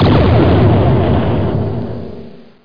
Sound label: SHIP EXP